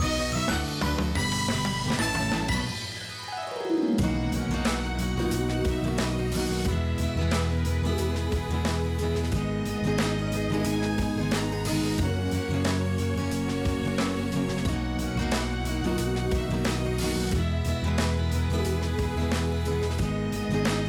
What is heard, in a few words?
Jingle Puzzle Clear; Pbff Music#55 (Jingle Ig Puzzle Clear)